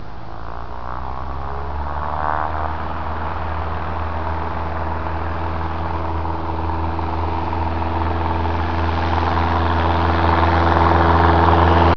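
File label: takeoff; 8bit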